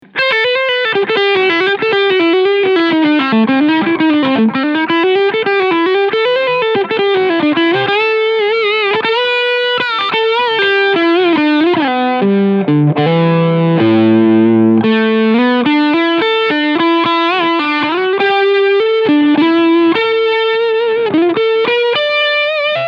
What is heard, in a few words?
Overdrive Preamp Switch UP Full Gain; JHS Pedals